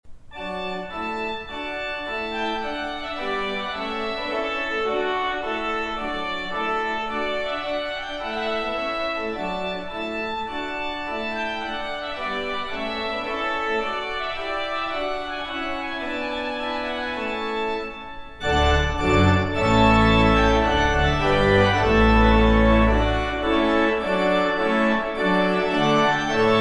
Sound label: Trumpet Tune